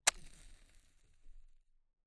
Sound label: front left, binaural recording; theverge